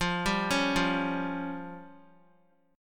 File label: F Suspended 2nd Sharp 5th F down Guitar; Fsus2#5 arpeggiated; JGuitar